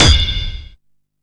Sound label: Kick, Drum & Bass; AMG - Prototype Drum & Bass